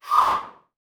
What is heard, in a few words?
eerie drone thunder